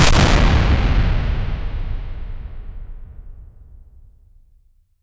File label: a sound of a heavy, athletics